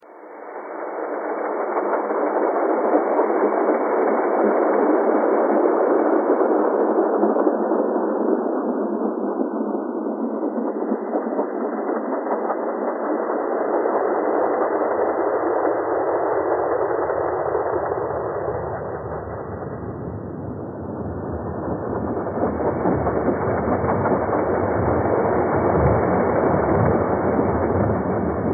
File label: train thread all